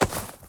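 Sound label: item generic up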